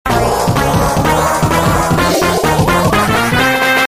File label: speedup; TF2Ware; Warioman